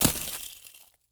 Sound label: imp magic ice